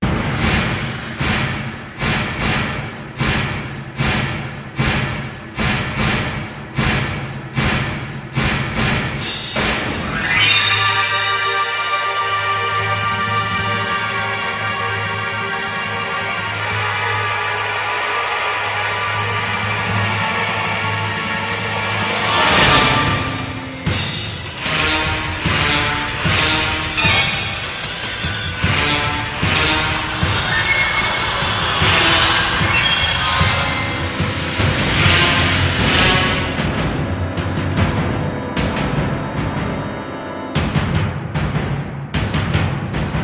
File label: BLADE TENSION